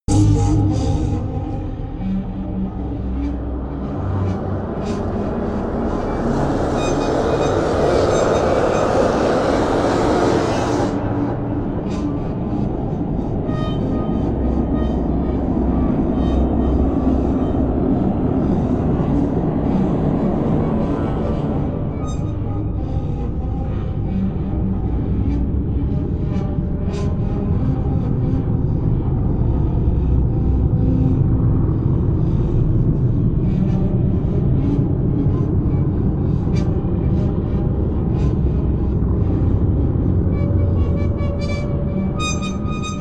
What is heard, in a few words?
atmosphere attacking low